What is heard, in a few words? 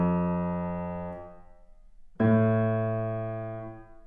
piano